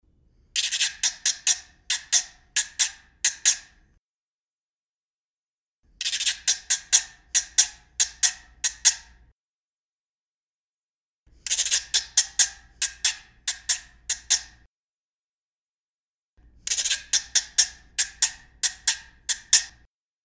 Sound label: RECO-RECO